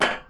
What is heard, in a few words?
Metal Foot Step